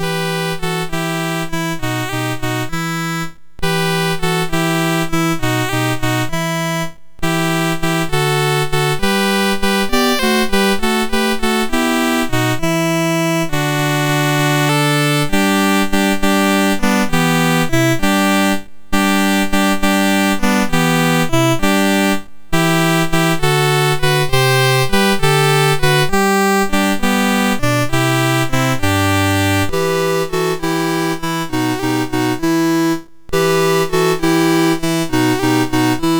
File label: We Three Kings of Orient are, MOS6581R2